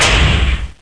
bash it; Total Chaos Nukem 3d Pack; duke

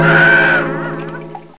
AHH; Ahhh!

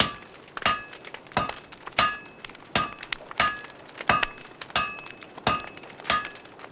Black Smith Loop; kult

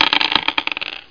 roll dice

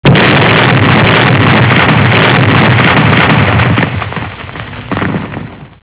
rocket; Spanish Net; ATTIC

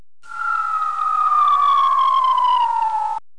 POING

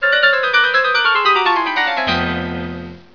RUN

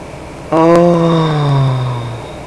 ohhhh; People; Sound Library 2000; Wayzata Technology

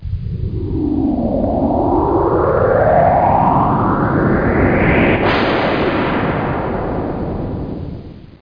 new warp

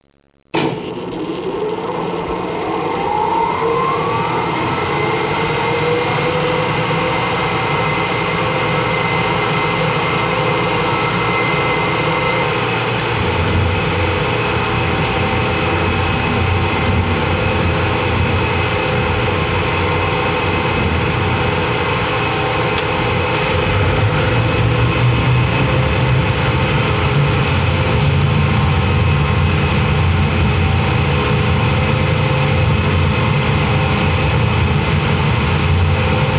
FAN; ambient; energy

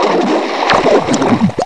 boat sink; KNEX

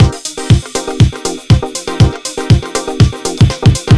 DANCE; Gizmo; hold music

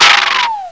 BAD sputter

